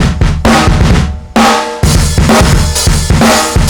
130 full, metal drum samples drums acoustic 130bpm; musicradar-metal-drum-samples